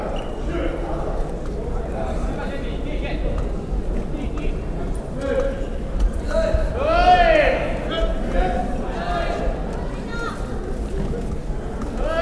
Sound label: judo